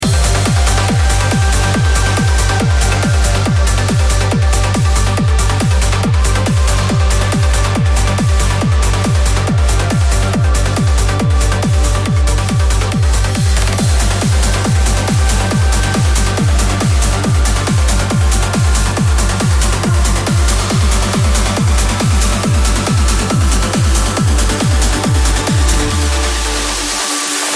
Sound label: Uplifting, No-Talking DJ Mix; Uplifting Only 670